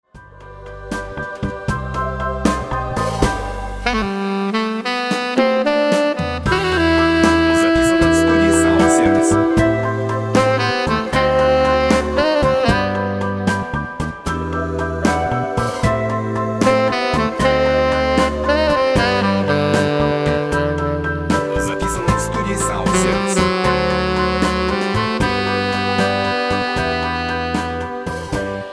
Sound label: Sax tenor